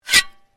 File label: Shovel Scrape; Working with a shovel or spade; Scrapes; Objects